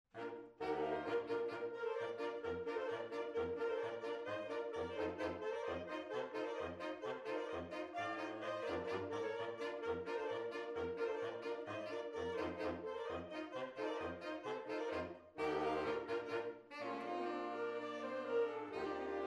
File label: Sax Polka